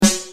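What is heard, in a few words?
snare ad202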